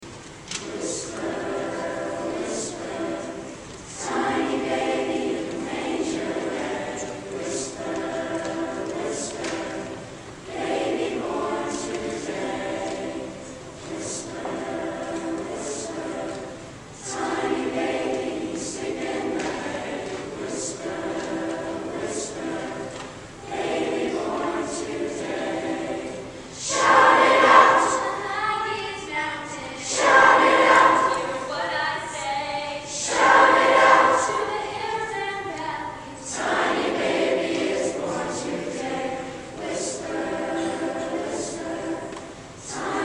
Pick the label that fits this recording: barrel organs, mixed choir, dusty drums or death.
mixed choir